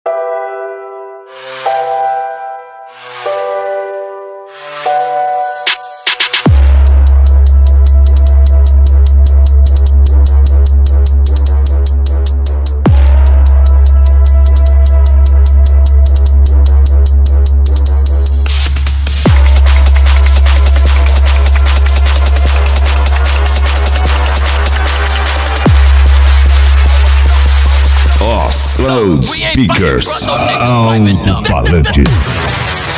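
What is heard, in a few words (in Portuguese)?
alto falantes loudspeakers 5250 w agressive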